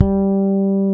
BASSES, Roland

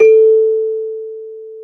CELESTA A4; AKAI S-Series CD-ROM Sound Library VOL-2

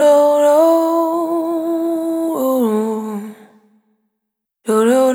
Vapor, Single Voice Melodic Loops

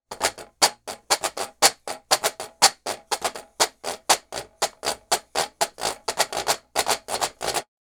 Washboard, Appalachian

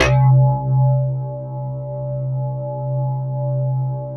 RATTLE BASS; Greytsounds; Scott Peers Sound Engineering

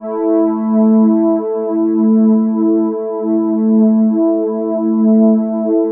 Cream Pad (5th)

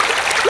STREAM; AKAI S-Series CD-ROM Sound Library VOL-8; SFX

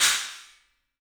cymbal stac; E-MU Producer Series Vol. 5 – 3-D Audio Collection; 3D Percussives

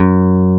BASS F#2, AC BASS, AKAI S6000; AKAI S6000 CD-ROM - Volume 1

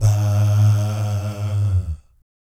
VIB FX, Vocal Planet Jazz & FX; ILIO; JAZZCOMBO

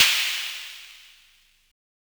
Snare, Univox SR-95

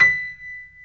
B5 STR PF, G.PIANO; AKAI S-Series CD-ROM Sound Library VOL-7

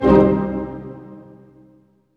HIT, Tutti Hits; Roland L-CD702; Dynamic Orch

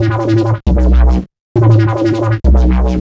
Bass, EDM

